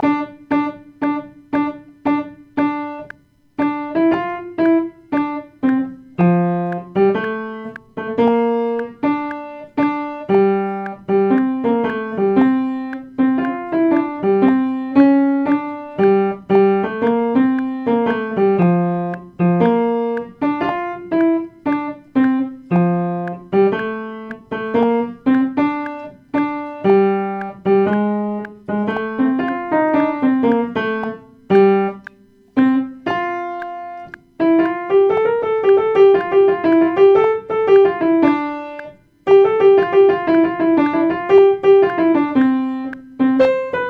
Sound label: alto practice, piano practice; christmas